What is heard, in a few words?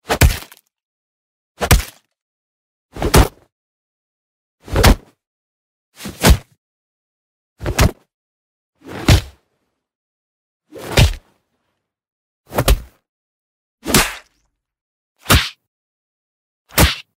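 kung fu udary, kung fu hits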